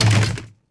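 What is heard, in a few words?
droid bodyfall large metal; droids robots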